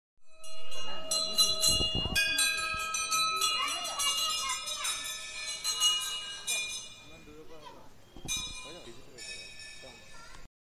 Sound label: Temple